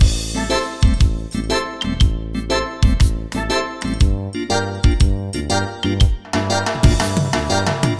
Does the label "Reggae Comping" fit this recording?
Yes